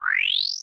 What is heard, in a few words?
Menu Zoom; Menu FX